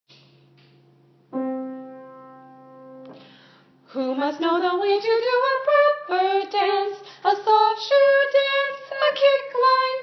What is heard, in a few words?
Tradition verse; Paulettes